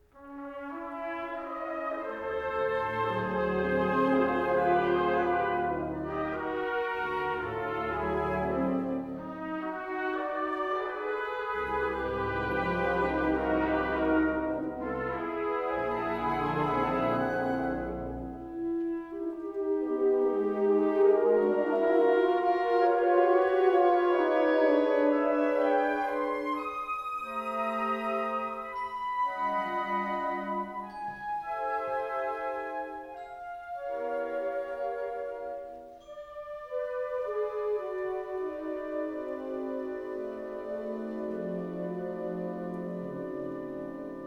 Symphony Band Lullaby

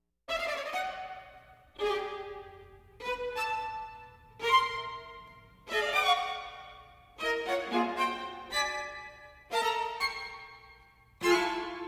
Piano Strings Sextet Fast

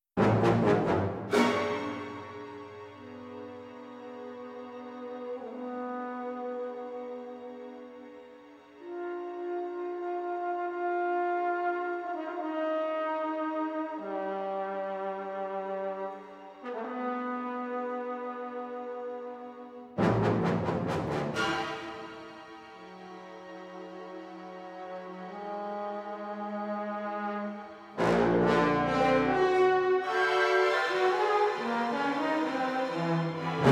Orchestra Concerto Lirico Assertive

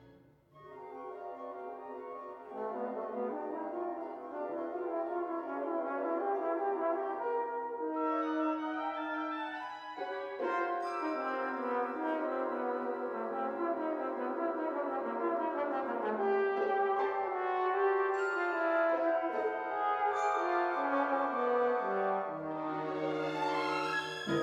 Orchestra Concerto Lirico Hushed

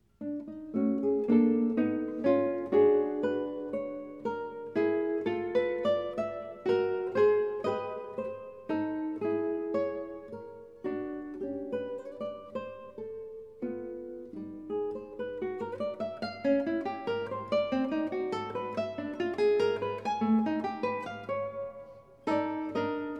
Guitar Quartet Supplication

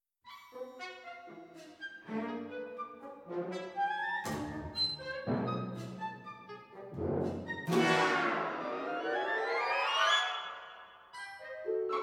Orchestra Concerto Lirico Scurrying

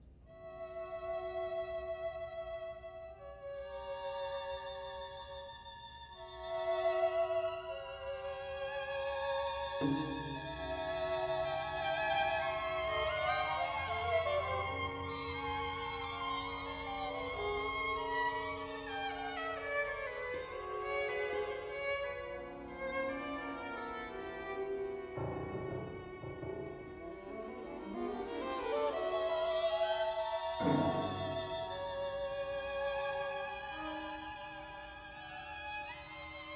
Piano Strings Sextet Slow

